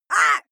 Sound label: female fight shout pain